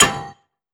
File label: frag physics bounce metal hard; gmod; viper